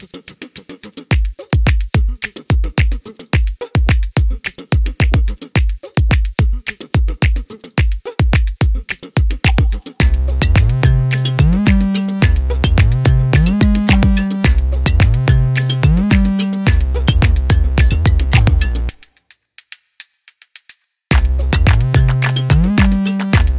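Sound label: hip hop, instrumental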